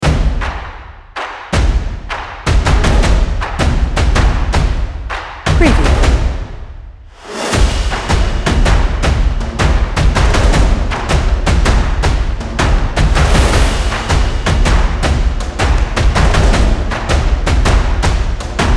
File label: Stomp And Claps Drums Hits Impacts Action Hyped Exciting Rhythmic